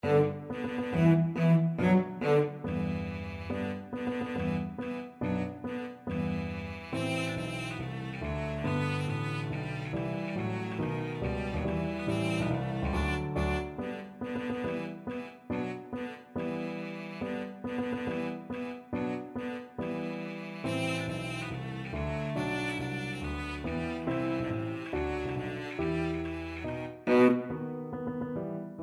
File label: nutcracker, cello